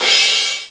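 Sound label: hihat crash; ST-XX Amiga Soundtracker Sample Packs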